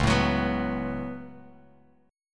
C sharp 7th Suspended 4th Sharp 5th, Bass Standard; C#7sus4#5; strummed; JGuitar